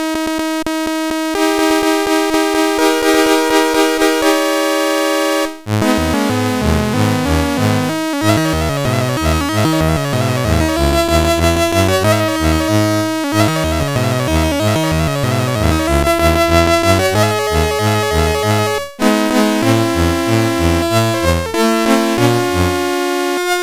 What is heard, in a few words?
sid CSG8580R5, GAMES